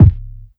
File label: boomy steel kick drum sound c sharp key